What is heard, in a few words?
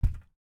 FOOTSTEP - Metal Grill Walk Barefoot Male; Timothy McHugh; Barefoot on Metal